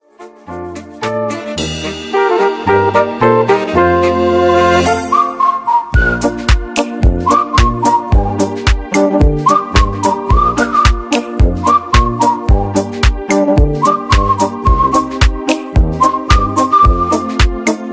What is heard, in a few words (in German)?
pfeif drauf ringtone